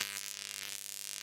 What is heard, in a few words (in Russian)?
Звук возможности комбинации карт loop